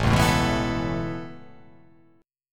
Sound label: A Major Add 9th A, chord